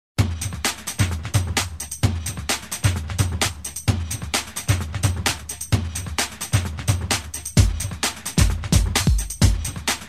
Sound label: impact